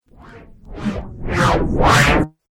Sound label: Riser Abrupt Stop Flanger; Rise And Dive Sound Effects